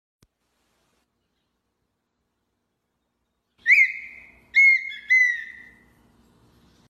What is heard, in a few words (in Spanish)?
tono pajaro; Fiu fiu